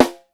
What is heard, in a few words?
SN, Percussion